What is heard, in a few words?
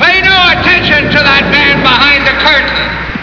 curtain